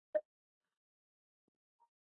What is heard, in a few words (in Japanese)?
有楽町線地下鉄成増東, train sounds